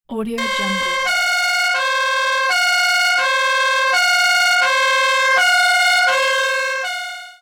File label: ambulance siren